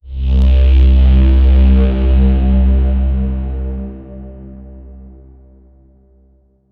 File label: synthwave outrun bass one